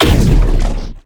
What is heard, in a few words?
shoot Beam Plasma Small; Mindustry